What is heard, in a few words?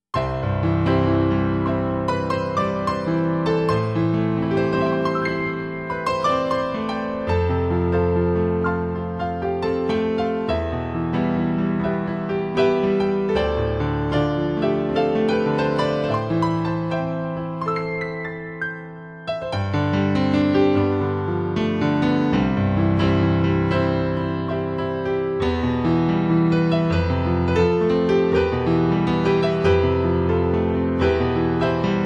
piano